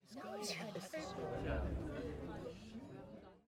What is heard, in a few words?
crowd Reaction jungle small Reveal; spellbound